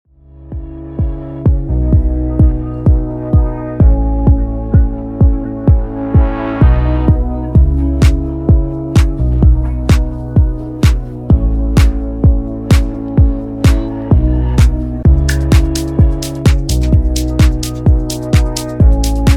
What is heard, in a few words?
Extended 128 Bpm